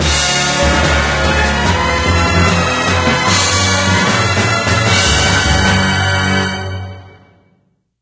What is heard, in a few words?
Victory; Life of a Naomi